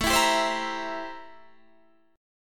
A-Minor 7th Flat 9th, chord

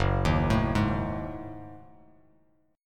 F sharp Minor 6th Add 9th, Bass Standard; arpeggiated; JGuitar

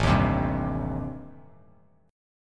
A-Minor 7th Flat 5th, Bass Standard, chord; Am7b5 strummed; JGuitar

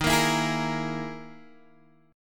E Major 7th Flat 5th

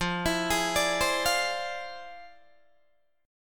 F Minor Major 13th, Guitar, DADGAD

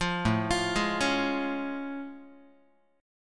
A Augmented Major 7th A Guitar; arpeggiated; 8,5,8,6,5,x; JGuitar

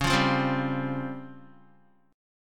Db Major 7th Flat 5th Db down Guitar; strummed

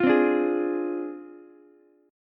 D Minor 7th Flat 5th Ukulele; Dm7b5; strummed

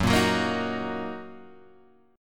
Fsharp 6th, guitar chord; F#6 Chord {2 1 4 3 4 2} strummed

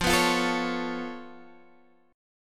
C sharp Augmented 7th F, chord

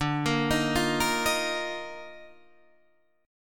Eb Major 7th Suspended 2nd D

